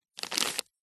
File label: foley packet baby wipes pull out single wipe; zapsplat